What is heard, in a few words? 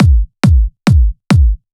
Kick 4_4 138BPM; Drums & Perc; A#minor; Fusion Kit; Vengeance Samples